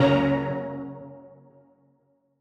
Old Skool F#2; KG MegaSaur; M8

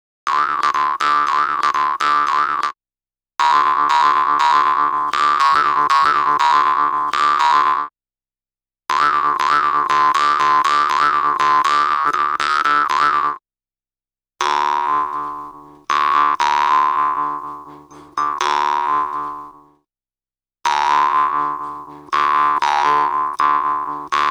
Didgeridoo And Overtone; Good Stuff Audio

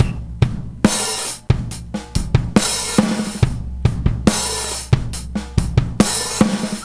70 bpm, breaks; Zero-G Beneath the Planet of the Breaks

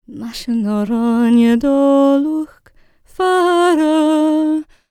MOURN, CELTIC, World Voices; ILIO; Vocal Planet VOL-5

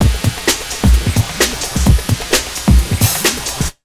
JUMP 130, Big Beat Drumloops

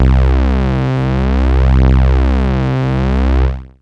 Techno leads, hits and riffs

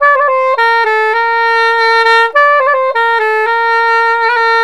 SAX, Balkanish Traditions

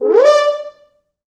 4 FrHorns FXMOD, D4; East West; Quantum Leap Horns Sax

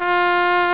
trumpet; Amiga Soundtracker Sample Packs

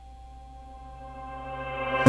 FARFISA ORGAN; Sonic Reality; Vintage Timetraveler

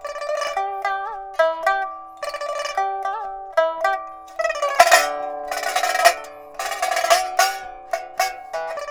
PIPA, 90; INIS - Opium

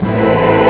old choir; ST-XX Amiga Soundtracker Sample Packs

